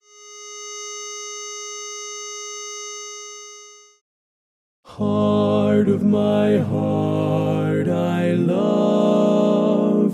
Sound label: lead left